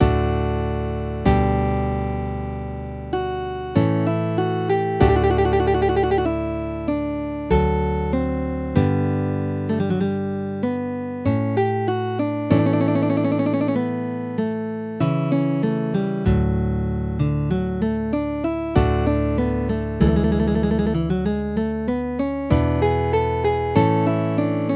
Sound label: bach cello suite no1 sarabande